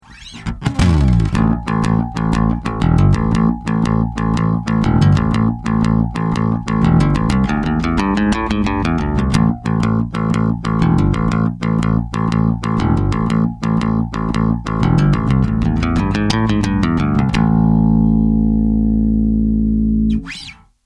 Treble Boost Pick

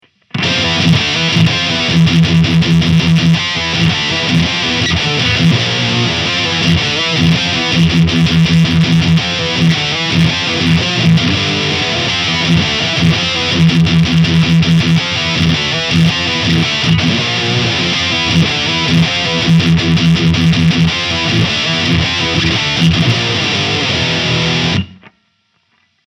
Chug High Gain; Solar Guitars

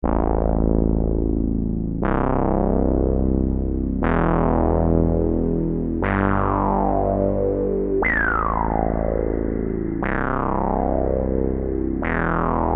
bass rev; Cybersound T1

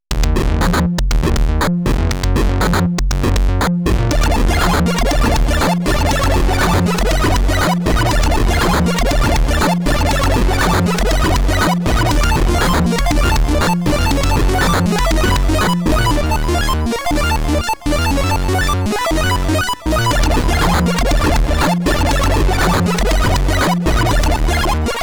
elektron analog rytm chiptunes

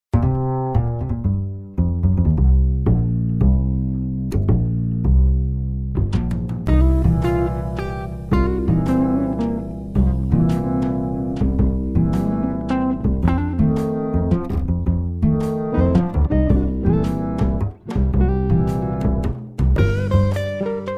waltz

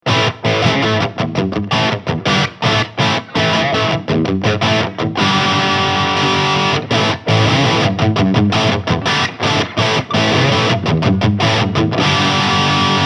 V Black Satin Crunch Booster On Off

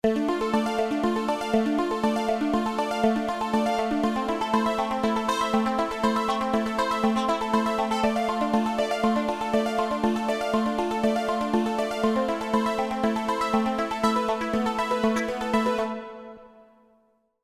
Beauty Arp

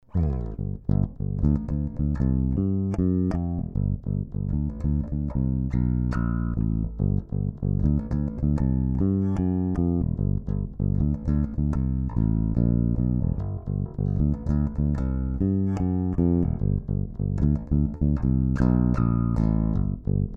Squier P Bass Finger

